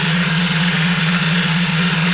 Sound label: rail, ambience; artus; Star Wars Jedi Knight II Jedi Outcast; XBOX